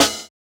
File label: Snare, jungle breaks drum sounds